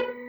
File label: crystal cathedral 100bpm stab; algorave